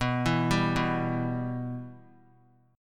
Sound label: B Suspended 4th Sharp 5th B; Bsus4#5 arpeggiated; JGuitar